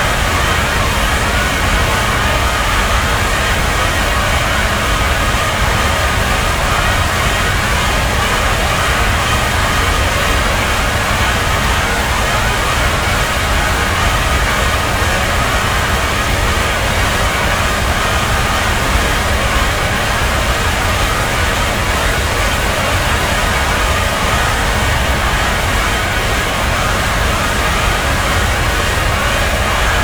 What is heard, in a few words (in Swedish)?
vokalist med storband